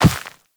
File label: Walk Dirt, Footsteps; Operator